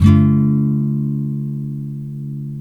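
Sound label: NYLON, D# MINOR DOWN, CHORDS; Spectrasonics; Hans Zimmer Guitars VOL-1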